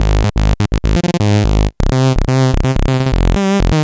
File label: Acid Bass; Sonic Foundry (Sony Creative Software); Underground UK House & Electro